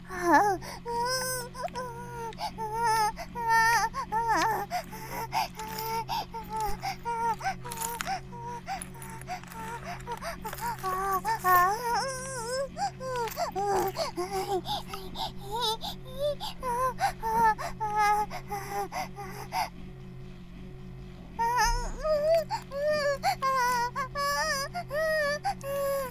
バイブ放置; イクたびにわけて

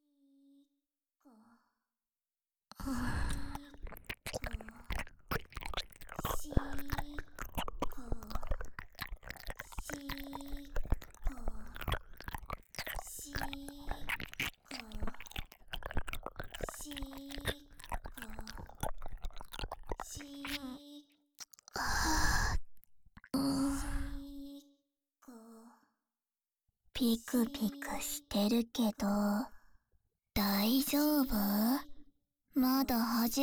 耳舐め, ASMR

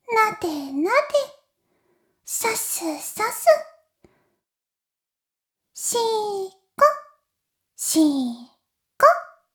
しこしこ音声, ASMR; おにいちゃんアイスぅー！

